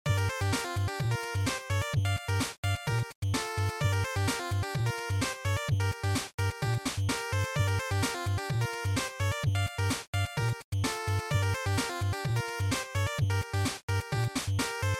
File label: Sports Result, bgm